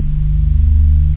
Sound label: ORGAN; OctaMED SoundStudio V1; drums bass